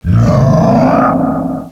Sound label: "mghrah", Echo inside caves, underground; DS; ML BOWSERS INSIDE